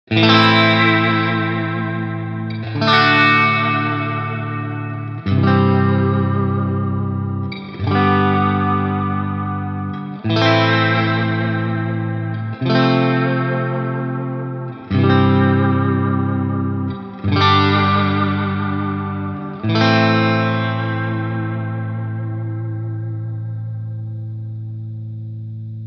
Surfy Industries Surfybear Classic Reverb clean

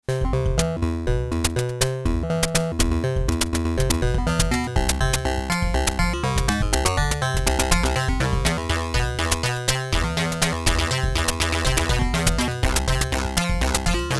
Algos Arp; Twisted Electrons Blast Beats

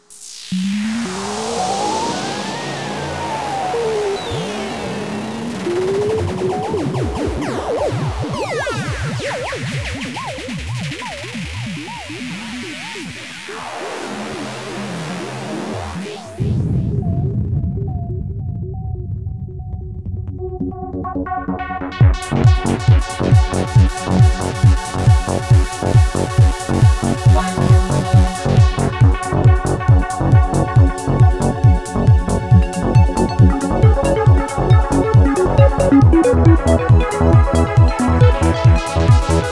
microKORG

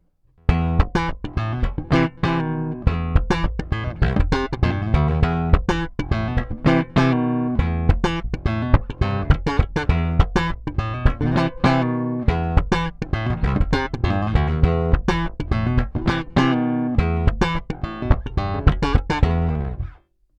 Preci Thump boost DI

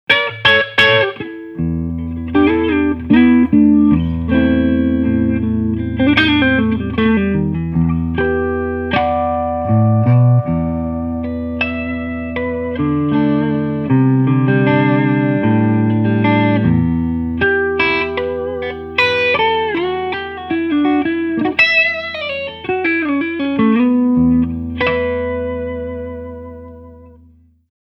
Clean Lead

